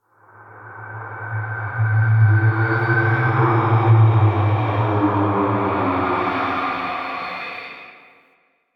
Ghostly Whoosh; Ambience; Man In The Wall

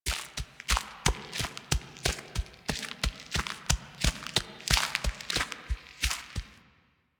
Horse Steps Trot Loop Dirt; Vehicles; Duviri

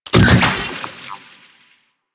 Shockwave Grapple Fire; Warframe; Venus; Venus Terra Shockwave Moa; Abilities

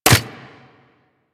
Nunchaku Catch; Warframe; Tenno

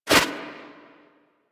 Tengu turbulence deflect; Warframe

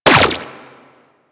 Laser Fire; Moa Laser

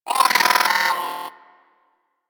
Hyena Micro Vocal Death; Warframe

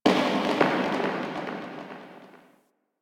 Interior Cave Rock Smash; Warframe; Infested Eris; Misc Indoor

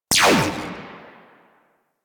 Spear Gun Tail, Weapons; Warframe; Corpus

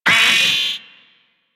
Vocal Pain; Warframe; Amalgam Moa

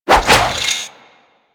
Movement Flip Whoosh; Enemies; Warframe; Kela De Thaym

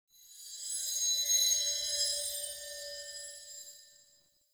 Ancestor Tree Spirit Chimes; Soul Plane

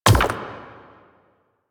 Hand Bomber Proj Bounce; Warframe; Man In The Wall

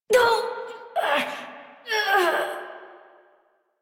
Death Adult; New War; Adult Operator

